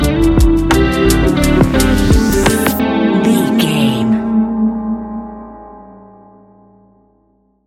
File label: late night embrace stinger, A relaxed and chilling piece of music